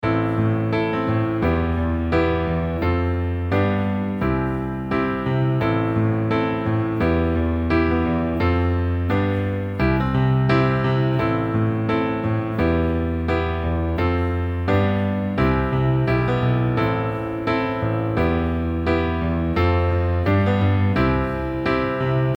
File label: broken arrange sabi